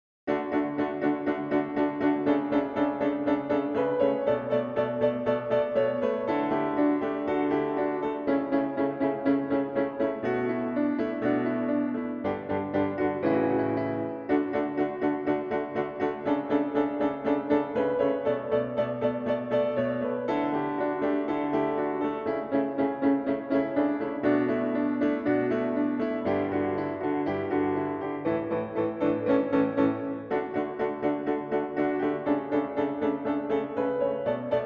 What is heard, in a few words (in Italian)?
minuetto